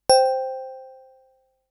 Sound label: Toy Chime